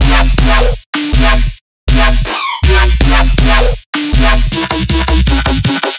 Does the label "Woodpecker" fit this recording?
No